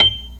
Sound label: B3, Drawbars